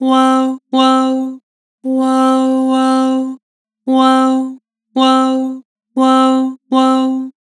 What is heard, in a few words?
Flat Woah, Vocals; Harmor Vocals